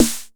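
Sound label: 909 snare drum; E-Lab; X Static Goldmine 1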